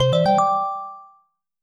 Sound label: TransferComplete